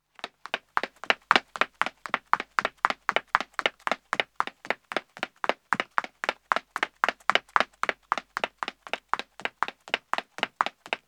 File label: Pavement Running 1 woman star, Footsteps; The BBC Sound Effects Library